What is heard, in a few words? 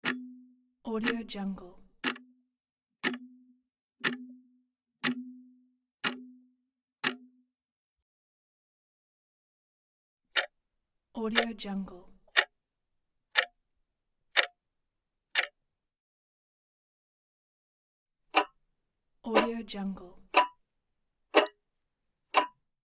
clock ticking loop pack; inspectorj